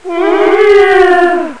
howl; duke

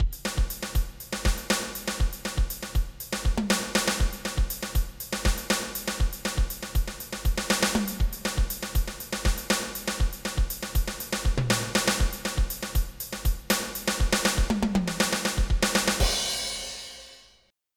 Funk

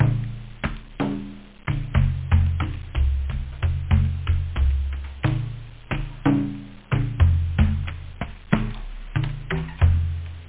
bongo

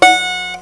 B Note, Guitar; The Guitar Tutor; Educational

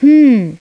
hmmm

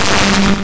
shrinker; Total Chaos Nukem 3d Pack; duke

